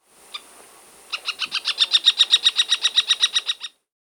Little Woodpecker (Veniliornis passerinus), Brazil; S. America